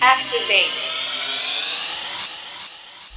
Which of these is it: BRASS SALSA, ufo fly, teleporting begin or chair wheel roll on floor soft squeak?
teleporting begin